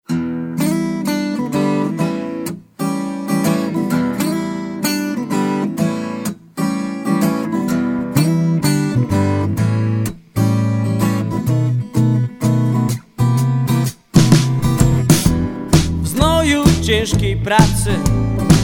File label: blues